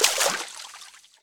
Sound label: Water Run